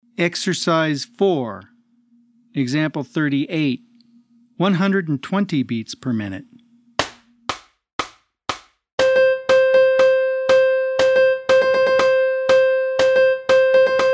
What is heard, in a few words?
120 BPM